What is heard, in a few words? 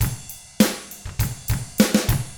ROCK, Drums; ILIO; Double Platinum Drums 1